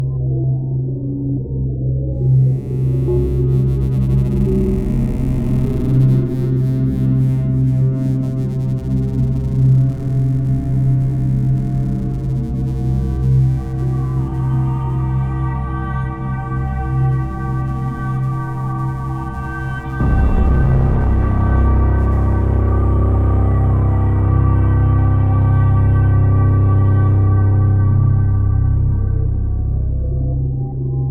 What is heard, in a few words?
Fear Loop; Face It - A Game to Fight Inner Demons